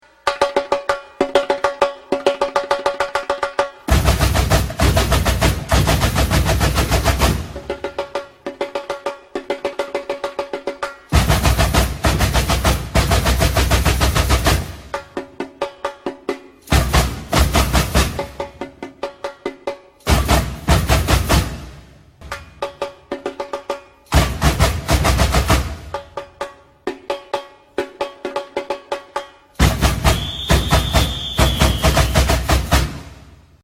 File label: carnaval batucada; extrait